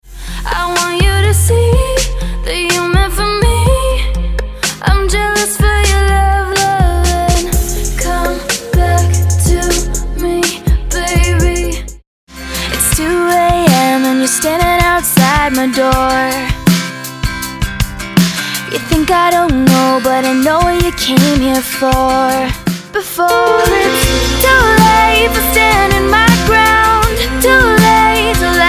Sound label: vocal